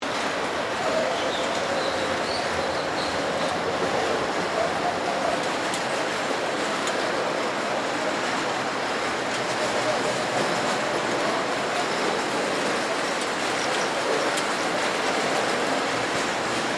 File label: Arcade Conveyor Belt, Technology, Arcade, Vintage, Conveyor, Belt, Chain, Driven; Blast Wave